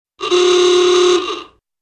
Horn retro car

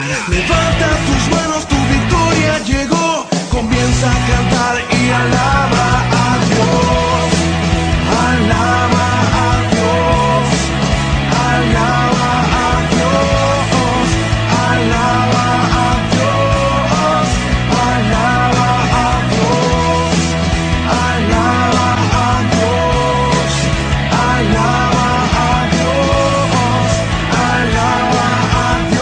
rock

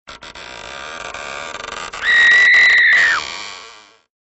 Evil Call

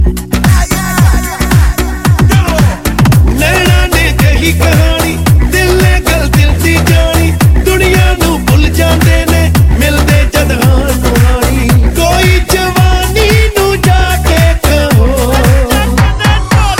Punjabi

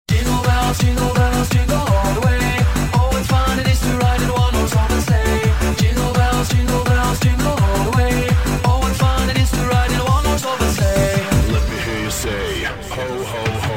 Jingle Bells

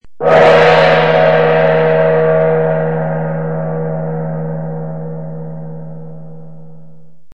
Gong

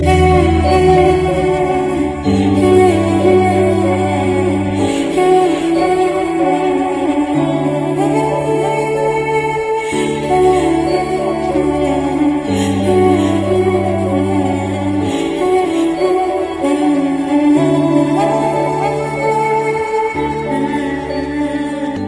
Humming

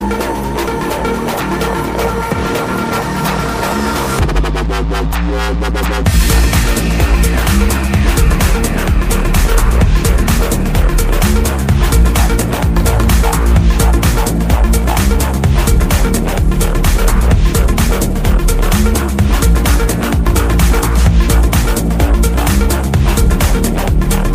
Hybrid Dubstep

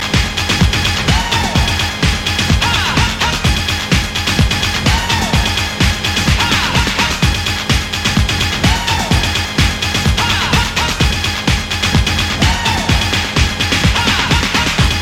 Techno Grovin

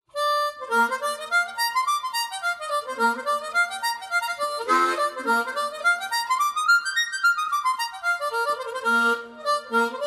Harmonica

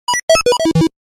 iihaa 8bit

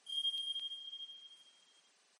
Ping Sound

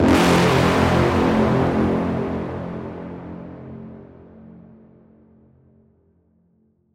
Brass Notification

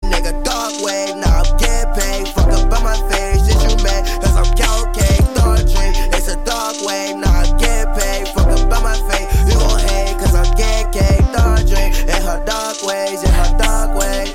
darkwave

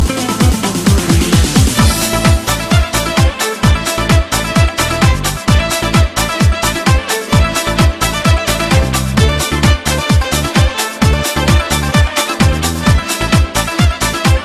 Music Gypsy

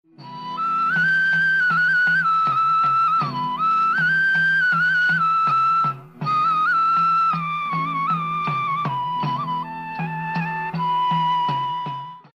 Idakka Flute Mix

